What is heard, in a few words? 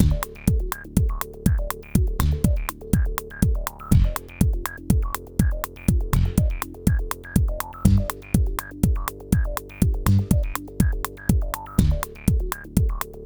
Farty Crooks, funny quirky